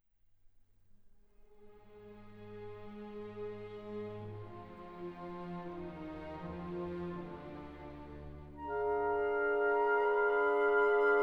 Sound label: Concerto in F minor Larghetto; chopin